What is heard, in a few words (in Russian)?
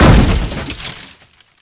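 упавшие сверху коробки с вещами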